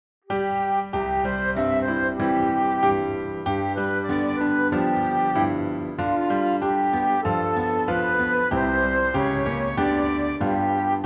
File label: piano flute; America the Beautiful